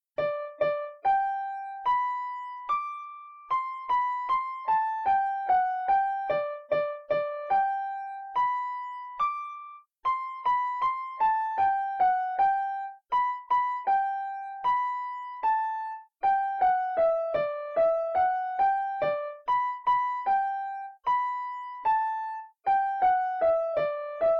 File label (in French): Ainsi font font font piano 1 main